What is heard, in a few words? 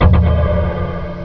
Magma Hit; BOOM